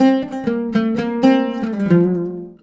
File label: LUTH H, Arabian Traditions, LUTH RIFF; USB Soundscan vol.43 - ArabianTraditions; AKAI